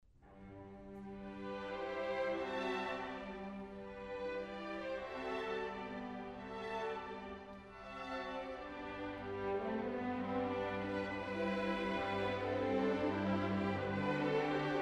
Serenade for strings in E Minor; Edward Elgar

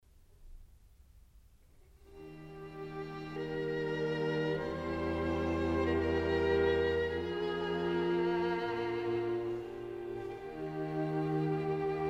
String Quartet in B flat

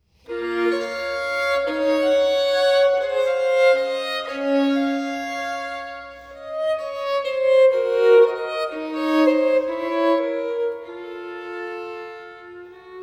Partita in B minor, Violin Solo; Partita nr.1; BWV 1002; Bach; Sonatas & Partitas for Violin Solo

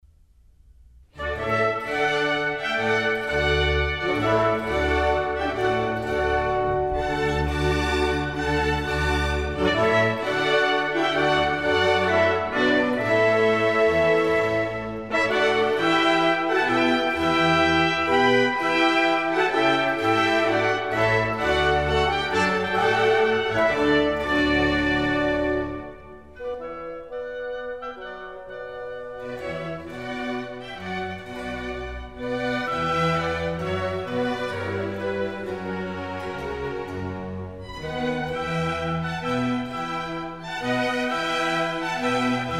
Water Music Suite

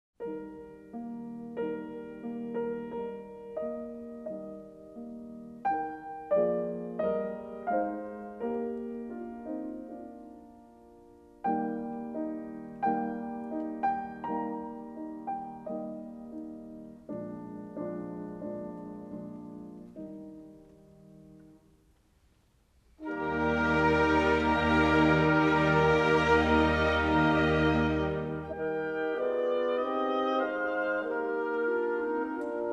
Piano Concerto No 24 in C Minor; Wolfgang Amadeus Mozart; Piano Concertos Nos 20 & 24

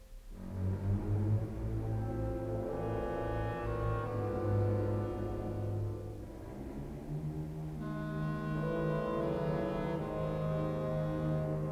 Symphony No 1 in F minor; Shostakovich; The Philadelphia Orchestra; Great Performances

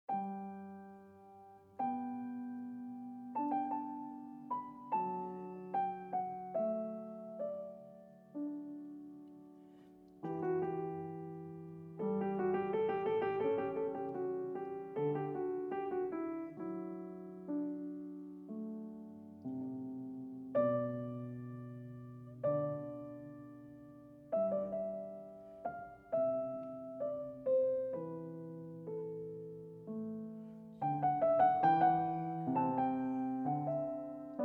Goldberg Variations BWV 988 Aria; Bach

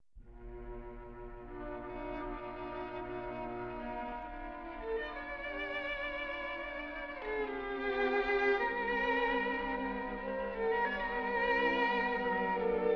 Quartet A Minor, String Quartets; Béla Bartók

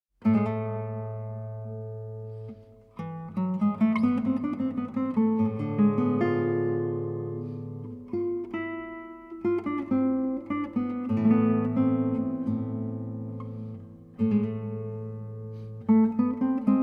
Guitar Suite in A Minor Preludio Presto; Johann Sebastian Bach

